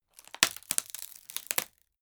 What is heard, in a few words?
wood crack slow